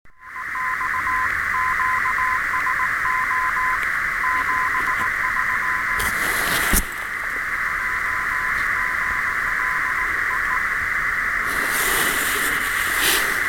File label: RX